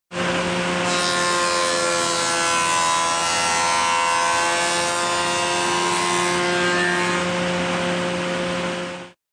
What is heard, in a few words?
Beeps; 헐리웃특수효과음; 신호-경적